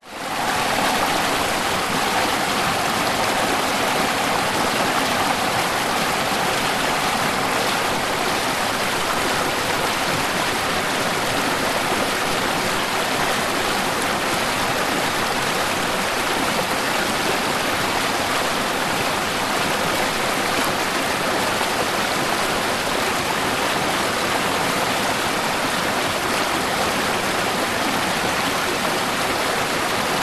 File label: nature waterfall small multi step; zapsplat